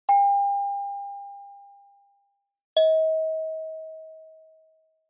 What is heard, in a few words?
Doorbell Ding Dong